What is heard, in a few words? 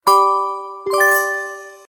Message incoming; Samsung SGH-T939 Behold II